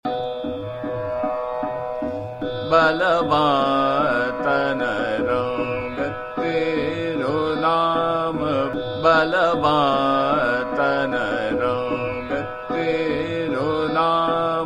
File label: Chota Khyal, Trital; Charukeshi; Balma Tanarang Tero Naam